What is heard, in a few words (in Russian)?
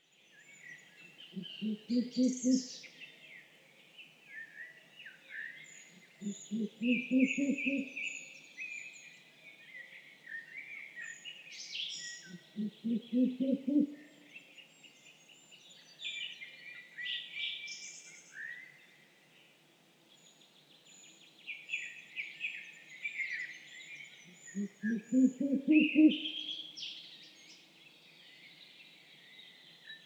dlinnohvostaya neyasyt strix uralensis, zvuki nochnyh ptits